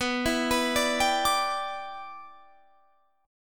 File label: E Minor 7th B